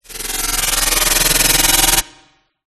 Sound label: sound design frequency modulation short rising noise sweep